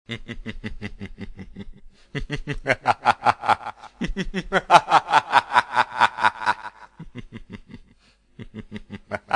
evil laugh; Nare Gewoontes

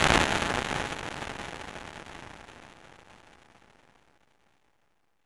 EXPLOSION, FM SYNTH; AKAI; 1000 Sound Effects

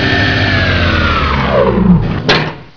ENGINE

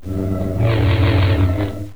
lightsaber; Star Wars; tpm mauls

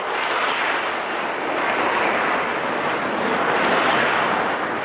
surface snow loop

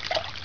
SPLASH, Water

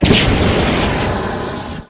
flamethrower on

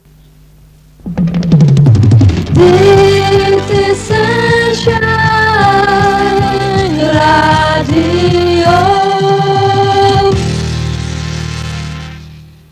sun Shine Jingle; SunshineJingles

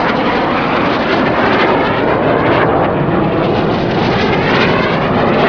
pod jet steady loop

